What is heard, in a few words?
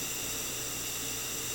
steam pipe loop; Half Life Alyx